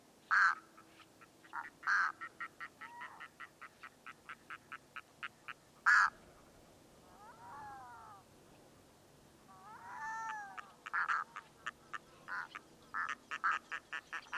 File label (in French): Plongeon catmarin islande